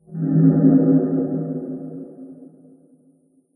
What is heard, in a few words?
change Air Drop; STALKER